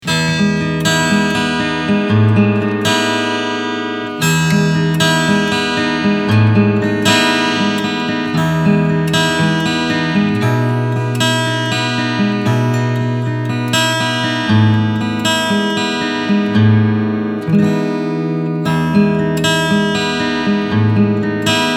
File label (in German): Picking Mikro